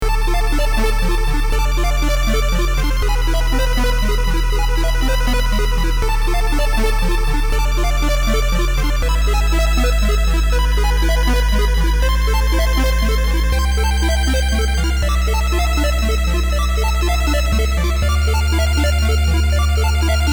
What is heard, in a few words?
arp dungeon; weeklybeats-2016